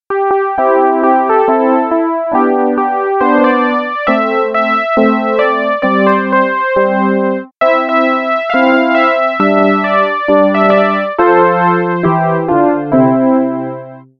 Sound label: epiano